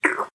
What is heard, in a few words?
slime nodes dug; minetest